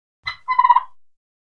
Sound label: turkey peeps